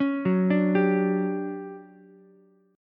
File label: D Major 11th Ukulele C6; arpeggiated